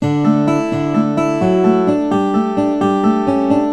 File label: Guit; GUITAR; 129 BPM